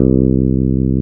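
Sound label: SMOOTH BASS C3; Drums In Your FACE!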